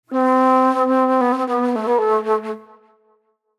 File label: Disco Flute